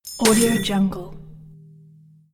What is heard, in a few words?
coins impact glass